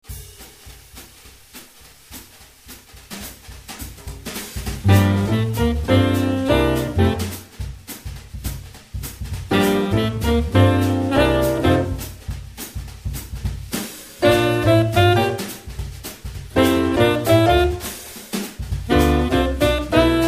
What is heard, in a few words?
Cute